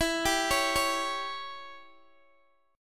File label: E Minor 6th E, Guitar Open D